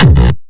bass kick

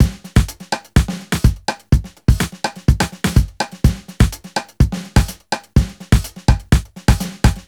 125 beat basic, Nu Jazz House; Nova; PowerFX

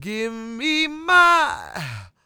SING W, Vocal Planet Blues & Soul, BLUESY; ILIO

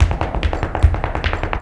Flamenco, ZAPATEADOSOL

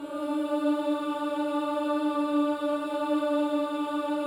Boys; Spectrasonics; Symphony of Voices

